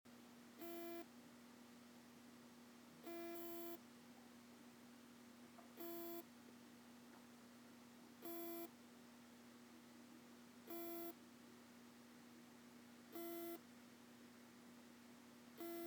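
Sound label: seized spindle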